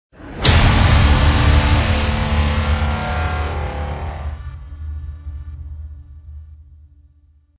Epic Trailer Intro Ident Epic Hit

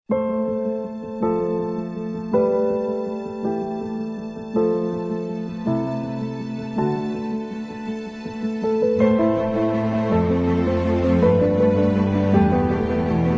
Inspiring Piano

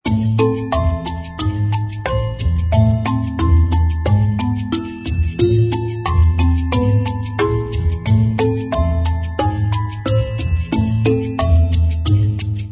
Sneaky Mischief Loop

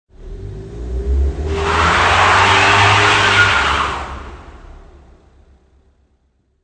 Car Fish Tail

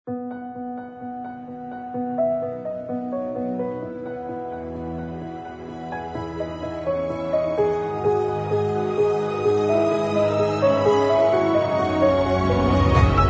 Inspiring and Uplifting Cinematic Trailer

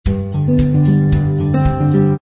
Shorter Phrase; 夏日温馨套装 Warm Summer Kit

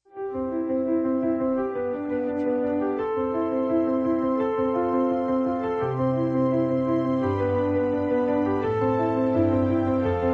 Epic Motivational Corporate

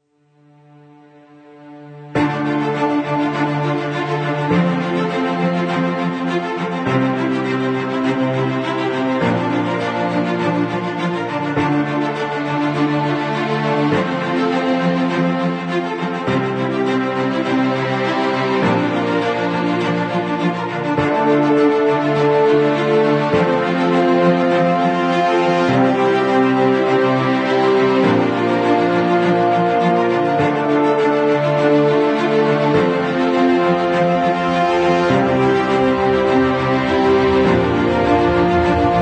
Epic Adventure

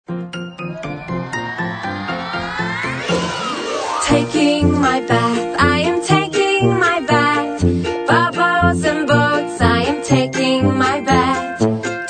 bath song

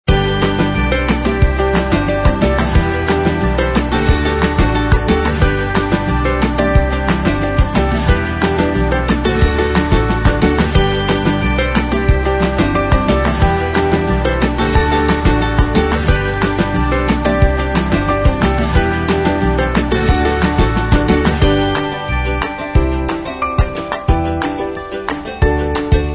Happy Energetic Upbeat Indie Corporate Fun Loop

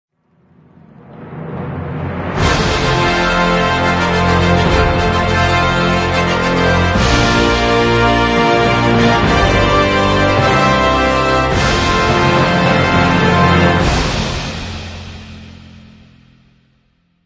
Opening Ceremony Intro Logo

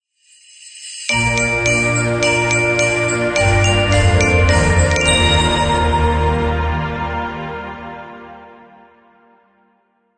Christmas Logo

